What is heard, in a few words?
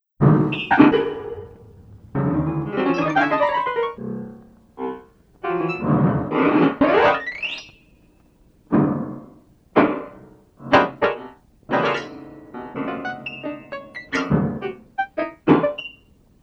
Study No.1 For Player Piano And Tape; 1957; lecaine; Compositions Demonstrations; Le-Caine Hugh